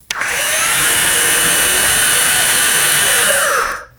small document shredder running empty; machines

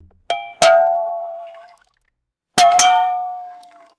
wobbly metallic bowl dings

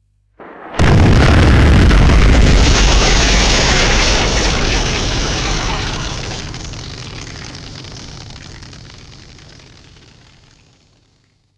large outdoor explosion with long echo

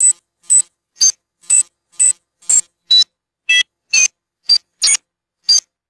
rapid arpeggiated electronic ui beeps

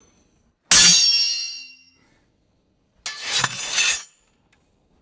heavy metallic sword hits and scrapes; weapons

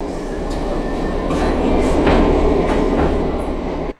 subway train door sliding shut; trains

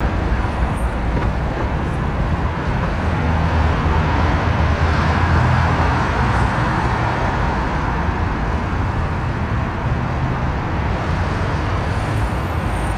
bridge traffic rolling over expansion joints

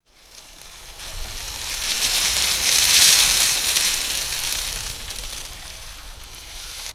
wind gust rustling dry dead leaves; vegetation

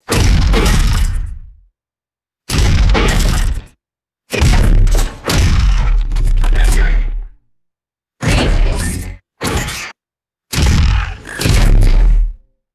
heavy metallic mecha robot footsteps

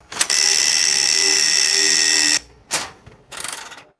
mechanical toaster timer ticking and buzzing; alarms

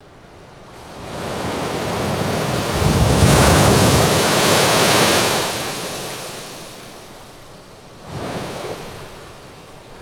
ocean wave crashing and hissing foam; water

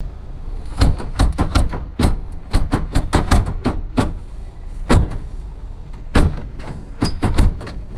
heavy bus door rattling and thudding; vehicles

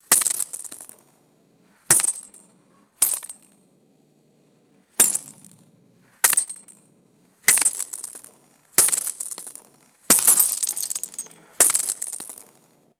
small glass bbs impacting dirt surface; bullets

